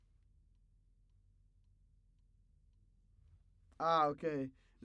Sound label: Trumpet